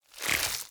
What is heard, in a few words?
atk beat rot